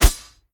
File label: swish; smite; gilgamesh